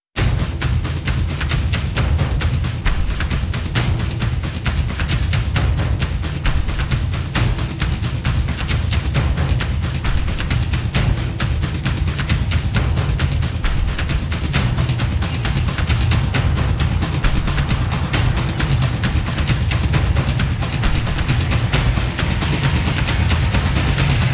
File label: Demon Drums; Immediate Music; Naked Prey